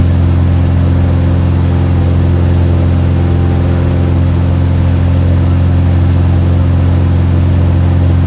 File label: zlin cockpit, engines; War Thunder